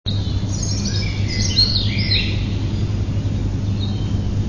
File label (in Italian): uccello